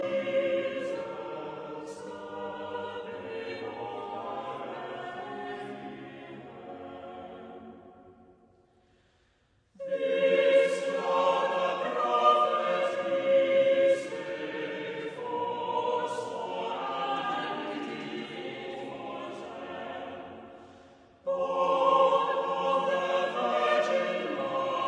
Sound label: carols